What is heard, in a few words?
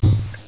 DROP